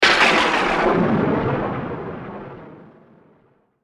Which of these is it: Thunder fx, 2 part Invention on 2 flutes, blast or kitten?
Thunder fx